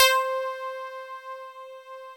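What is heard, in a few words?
clavinet C5; CLAVNUTZ; Synth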